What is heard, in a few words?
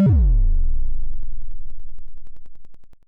synth, amiga med